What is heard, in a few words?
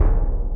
orch bassdrum